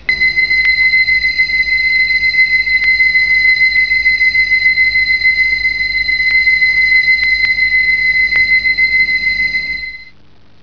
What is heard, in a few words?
IIIHH